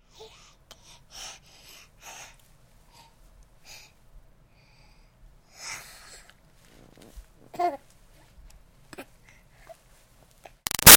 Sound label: Baby 6 Month Old Breaths Yawn; Babies (Sound Effects:Voice); Big Room Sound